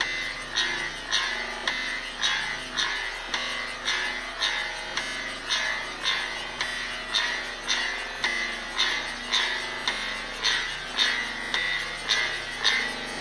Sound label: fatal noise slow